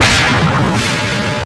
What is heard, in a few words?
cat; SMB3Memes; EoSD Chiptune